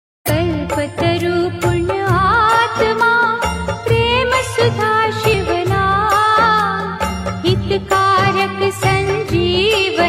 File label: Shiv amritwani ringtone